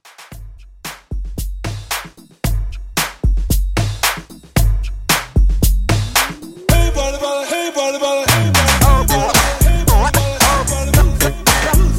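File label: RB with Scratch Intro 113 Bpm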